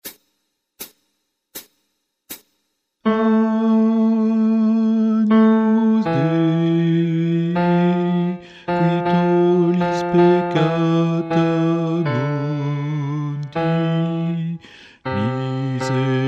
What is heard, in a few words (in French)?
Messe de St Paul Agnus Guide chant Basse